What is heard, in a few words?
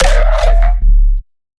bass hit hard foley D, Bass Stabs; Virtual Riot